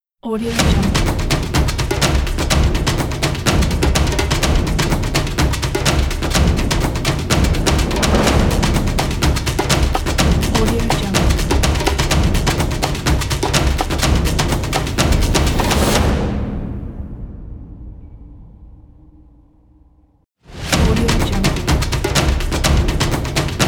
african percussion logo

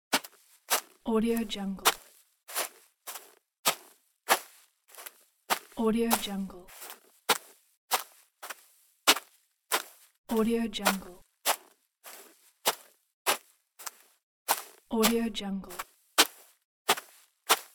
footsteps on grass

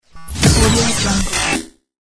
glitch

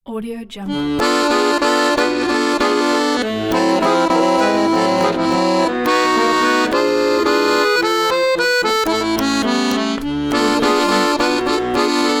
russian accordion; francemedia1

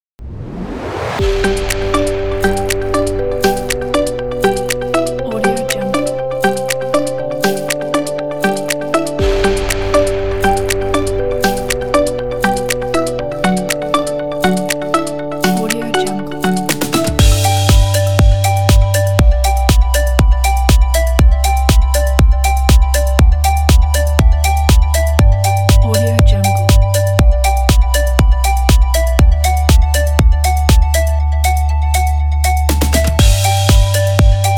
corporate spring; audiogo

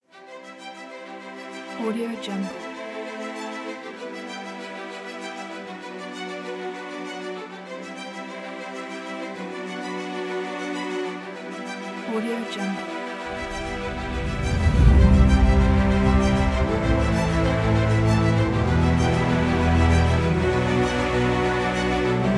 motivational epic music kit; aurasound

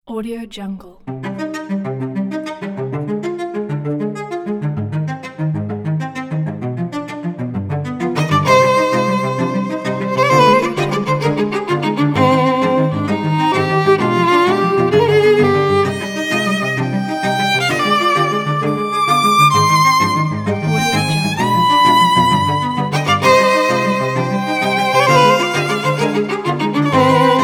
expressive cellos and violins pack

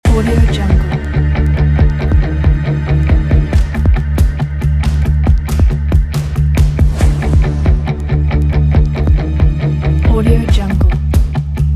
tension loop

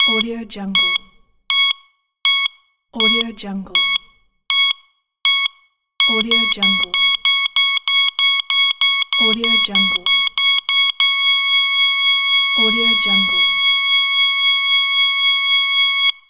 heart monitor; soundsground

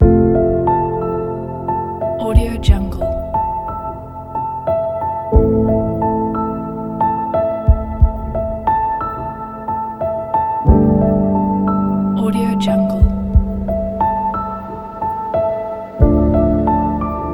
emotional piano soundscape

